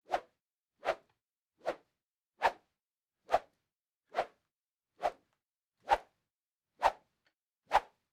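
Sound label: swings cutting of air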